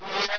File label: BUG; 昆虫